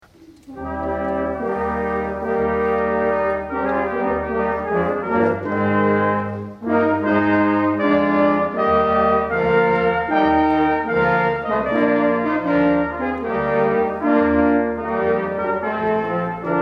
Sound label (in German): Funff stimmigte blasende Music; Orchestra